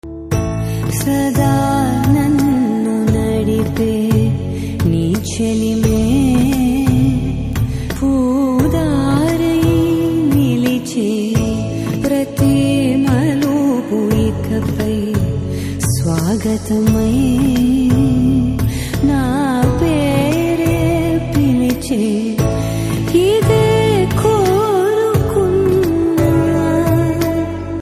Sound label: Ringtone